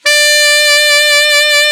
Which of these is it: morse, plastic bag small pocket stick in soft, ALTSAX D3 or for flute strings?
ALTSAX D3